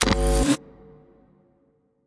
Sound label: buildup; Smite; Baron Samedi